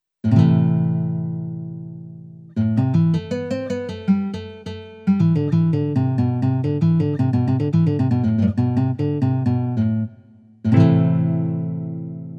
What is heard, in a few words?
Nylon guitar